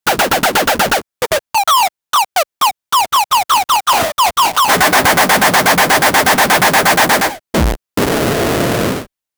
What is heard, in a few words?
wrestling attack punch, 16bit